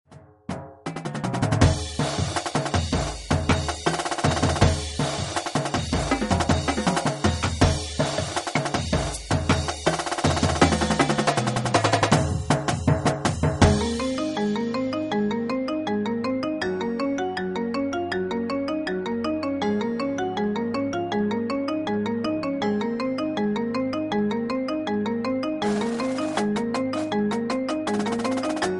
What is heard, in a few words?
percussion sample; Oh, The Places You'll Go; Marching Band-Percussion Focus